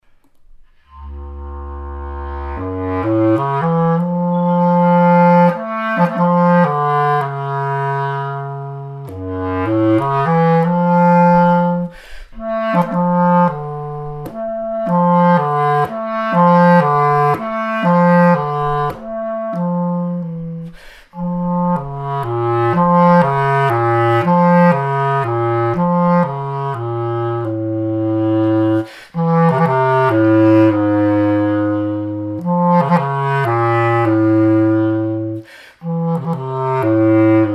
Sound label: bass cl